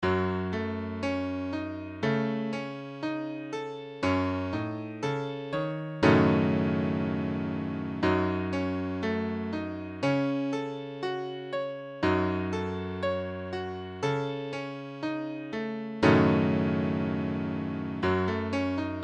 Gbm6, piano

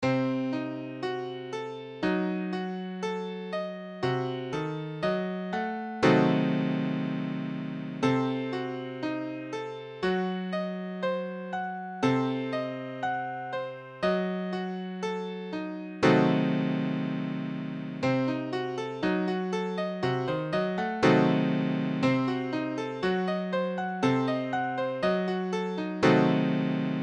Cdim7, piano

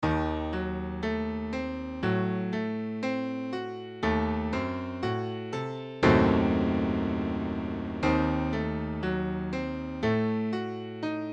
Ebdim7, piano

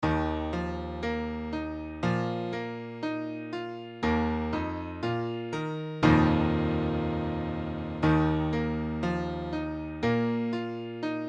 Eb sus2, piano

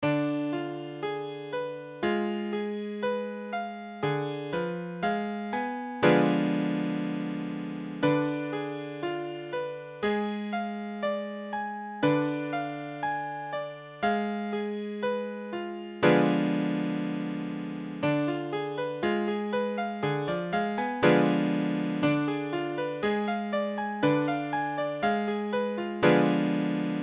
Ddim7, piano

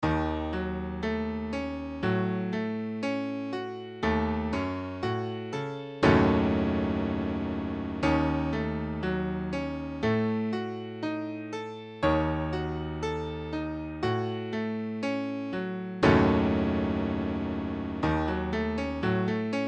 Ebm7 5b, piano

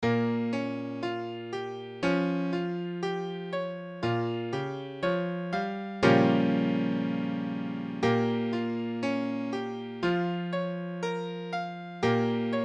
Bbm6, piano

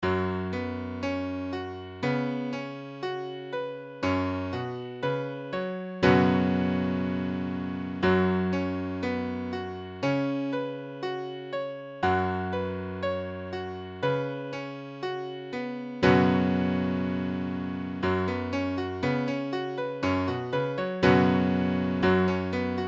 Gbsus4

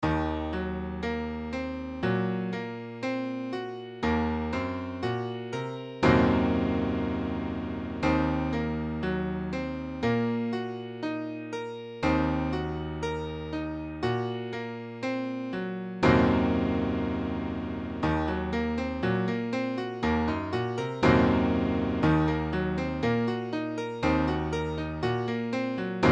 Ebm, piano

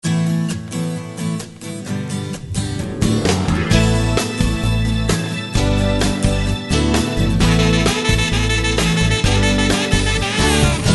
Minisong, guitare

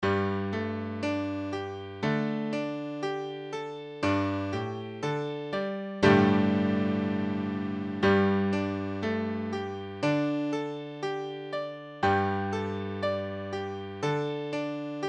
Gsus2, piano

